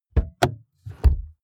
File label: car bmw e46 interior door rear open; Cars